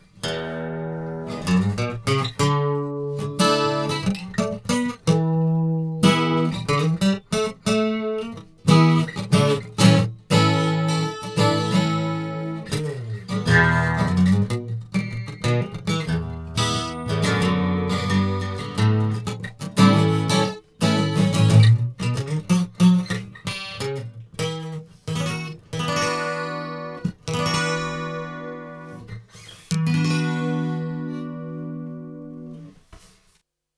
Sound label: AMS dmx 15 80S Guitar HarmPitch